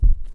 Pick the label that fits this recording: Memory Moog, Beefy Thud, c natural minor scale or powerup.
Beefy Thud